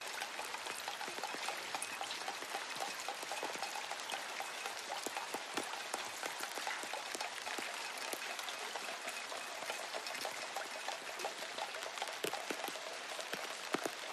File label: rain city modarate drippy; PMSFX